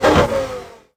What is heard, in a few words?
shift down; F1; FSR 2010